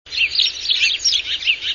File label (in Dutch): huismus